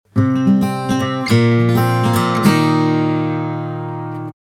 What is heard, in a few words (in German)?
Soundlogo Akustikgitarre; Gaffel